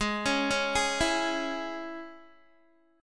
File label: G sharp Suspended 4th Sharp 5th, G sharp, down, Guitar; arpeggiated